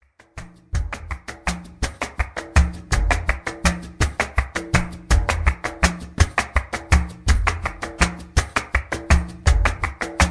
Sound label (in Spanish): TANGUILLOS